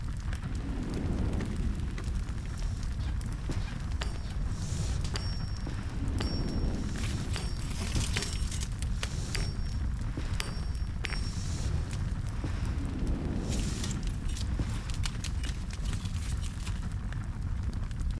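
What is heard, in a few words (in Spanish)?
Ambiente Fragua, forge ambience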